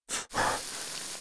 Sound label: pl burn pain, player